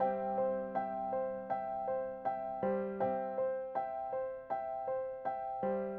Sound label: Delicate Piano